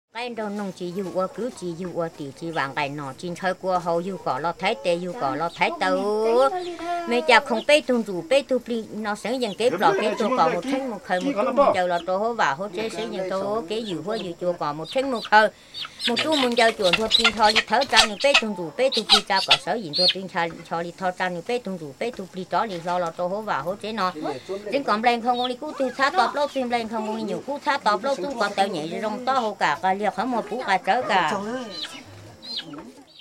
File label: Hmong Tria Instruct Chickens